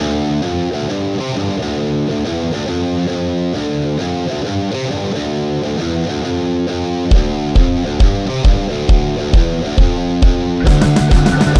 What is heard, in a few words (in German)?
gitarrensound im bandmix scooped